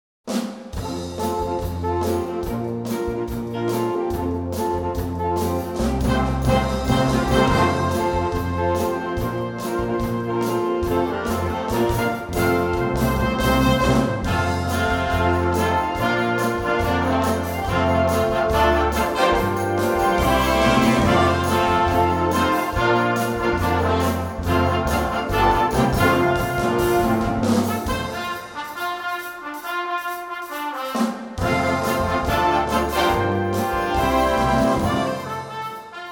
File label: Spiritual And Folk